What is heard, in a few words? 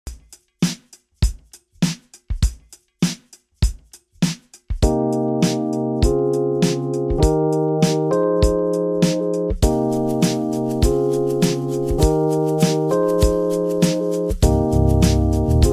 Outro Music